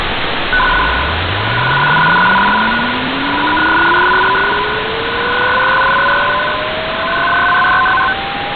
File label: DIY-1 satnogs RTTY